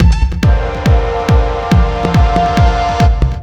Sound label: TECHNO BEATS 140